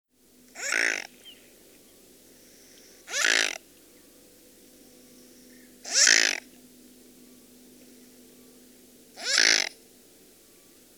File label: Mute Swan